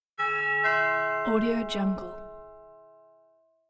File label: doorbell; moonaudioproduction